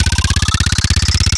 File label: LOOPS, MIXED D LOOP, DANCE NOW